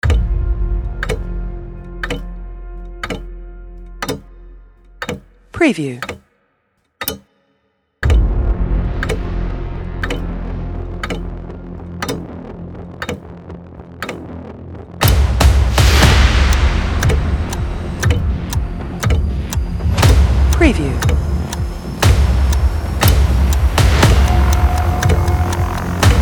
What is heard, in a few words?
Clock Ticking Time Bomb Tick-Tock Cinematic Action Trailer Music